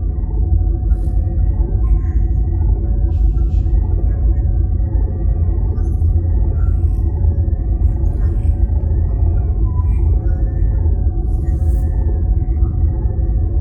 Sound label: bridge, ships rooms; CosmicRage; CosmicRageSounds